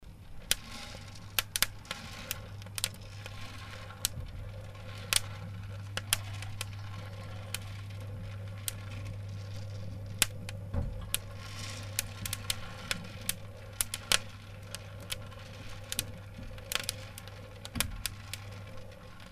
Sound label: popping